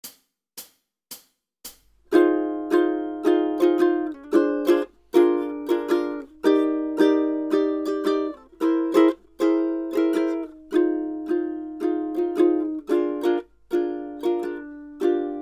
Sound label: rhythm study, ukulele; grade5